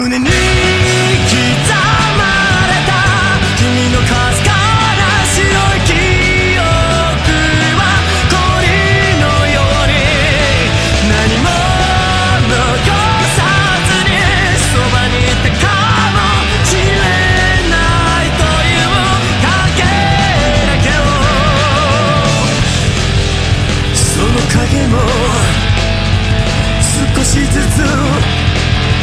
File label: ringtone